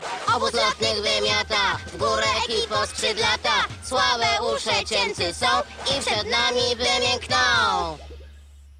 Chant, Polish; Junior Speedsters Chant; Season 1

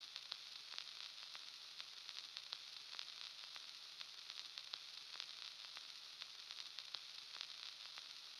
Old Film Static Sound; Lewd SFX

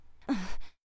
Grunt; Lewd SFX

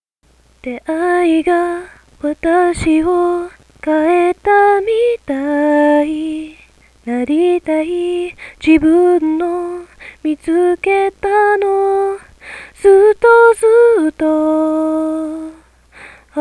Singing, occasional stumbles mistakes, Japanese; F4A

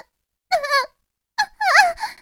Moan

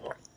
Suck

